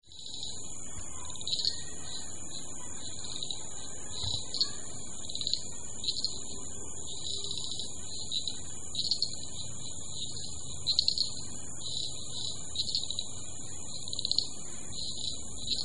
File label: Common Shrub Frogs